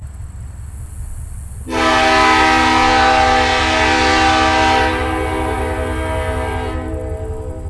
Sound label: horn